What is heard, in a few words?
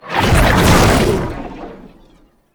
Perfect Heavy Attack Activate, Weapons Melee